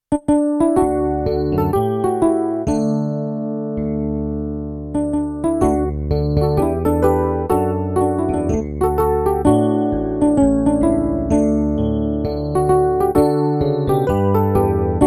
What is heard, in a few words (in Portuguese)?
playback hino